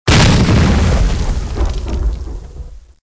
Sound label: cart breaking